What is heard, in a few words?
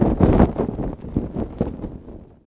parachute open; PC Gamer IT CD 47; USAF; USAFDEMO